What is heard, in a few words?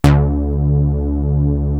SH3 BASS; ZERO-G; Datafile